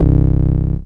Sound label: BASSGROWL C2, Yamaha TX-81z